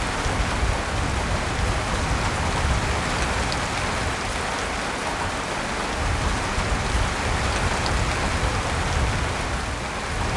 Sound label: storm; Soldier Of Fortune II Double Helix; XBOX; ambience; prague